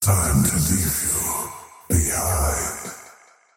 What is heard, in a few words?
attack pain; dota; faceless void